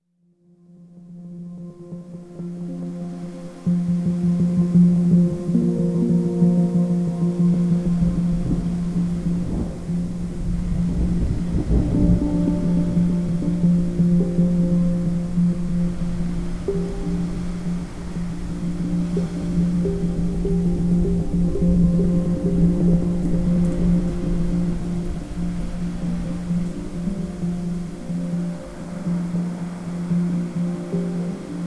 Gentle Thunderstorm With Ocean Waves and Hang Drum; New Age; Healing and Relaxing